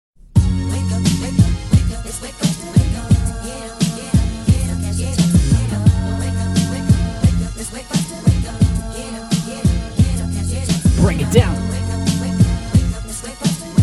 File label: 70s 80s old school party mix; mix966fm friday feel good quick mix